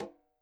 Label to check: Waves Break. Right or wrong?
Wrong